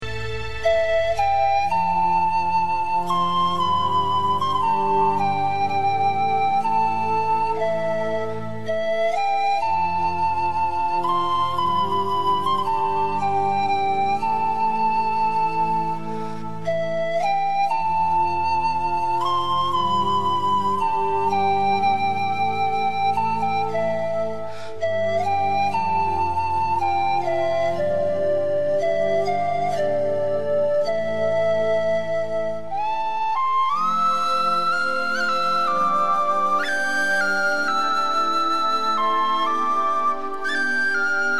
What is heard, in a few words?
pan whistle; braveheart